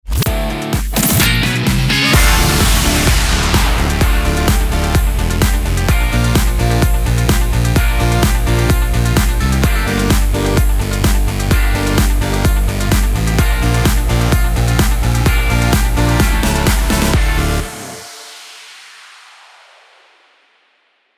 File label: Costume Party Contest Kit Stager Winning 128bpm KEY d